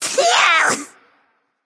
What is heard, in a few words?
clear throat; smite; scylla